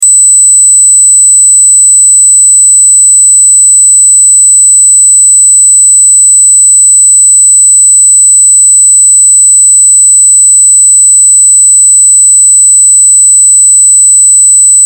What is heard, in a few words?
Test Tone Square; Wave 8Khz 60 Secs 0Db Loop